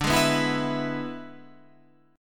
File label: E-Major D